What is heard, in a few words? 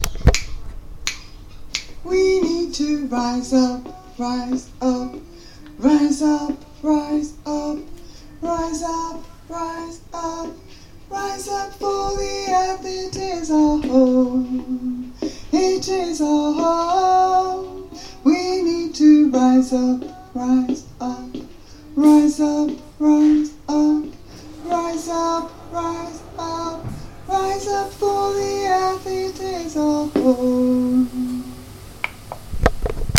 Alto